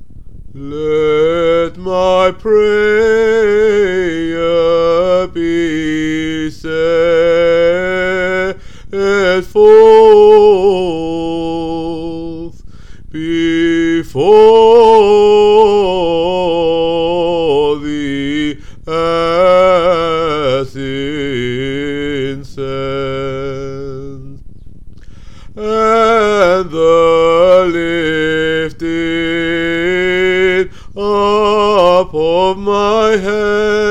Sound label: VOICE tone 2; Let my prayer